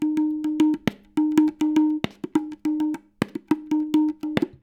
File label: jam loop; webaudio; hyper-reality